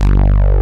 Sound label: FAT SYN, JV 1080; Pro-Rec - Synthsonic